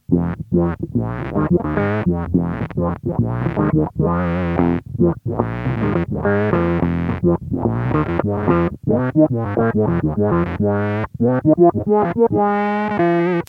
Auto Wah And Sub Octave, guitar synth

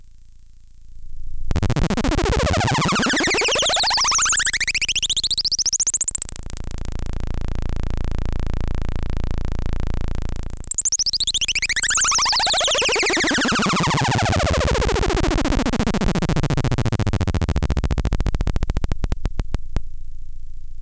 saucer taking off and coming back; NOISE TOASTER

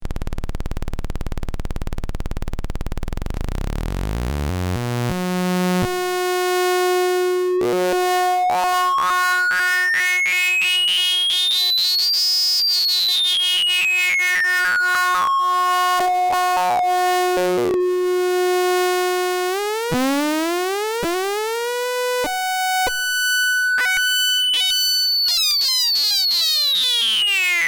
vco sawtooth sync; analog synth

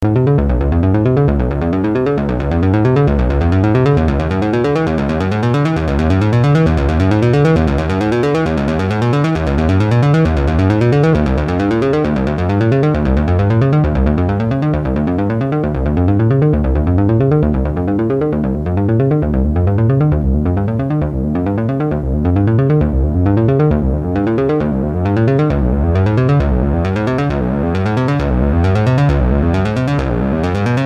sequencer, analog synth; ten step sequencer

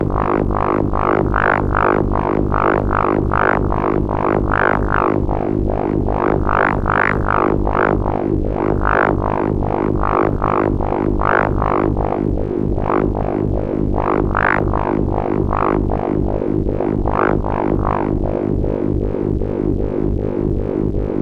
garbled speech; NOISE TOASTER

